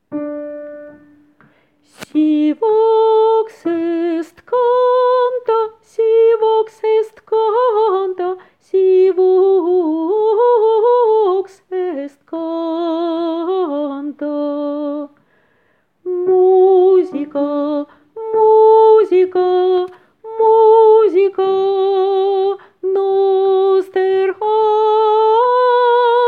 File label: Si vox est canta Alto